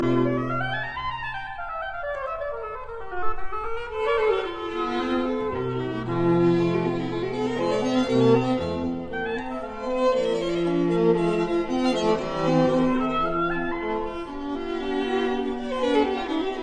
Piano Trio arr for oboe and string trio Allegro; No 1 in G major; K 496

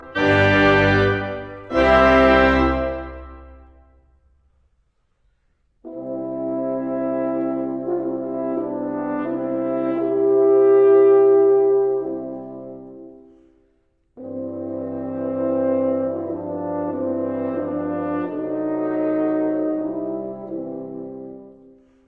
Overture arr for wind ensemble; Rossini; Semiramide

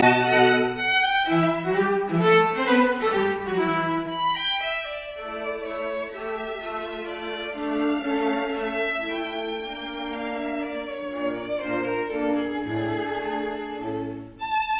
String Quartet Menuet; in D major; Haydn; Op 2 No 5; Hob III11

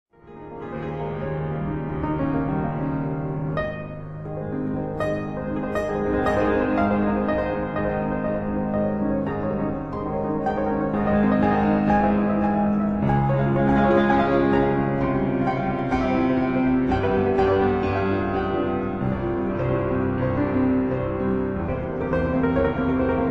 Etudes for piano in Ab major

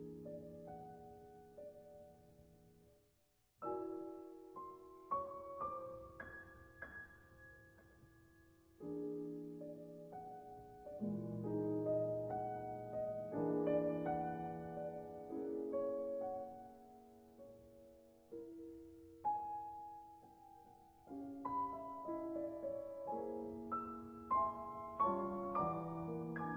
Piano Sonata in F-sharp major; No 5; Op 53

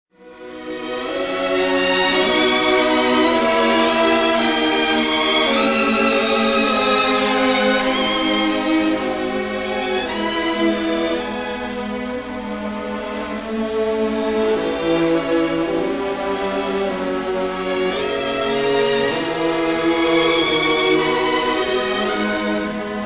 Symphony in E minor Allegro non troppo; Brahms; Op. 98

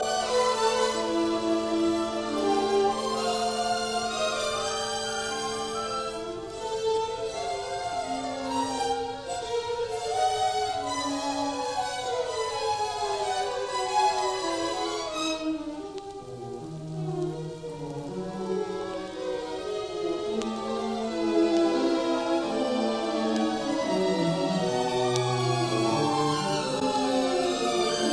Moderately fast, Symphony Orchestra; Hindemith; Symphonia serena; Philharmonic Symphony Orchestra

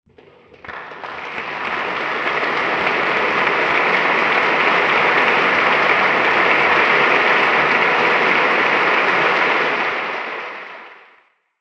Applause

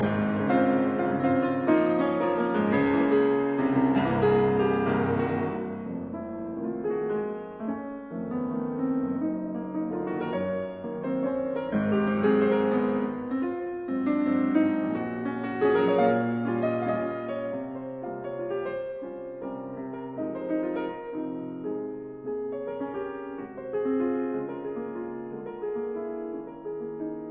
Mazurkas in F minor Allegro; 9 Mazurkas Op 25 No 1

